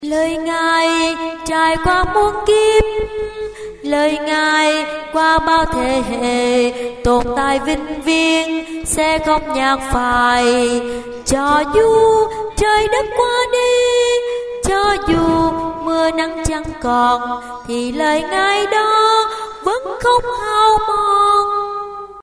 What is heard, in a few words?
tenor basso